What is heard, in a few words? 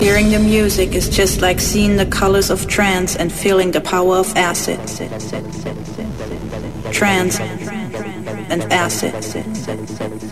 Trance And Acid; Anthems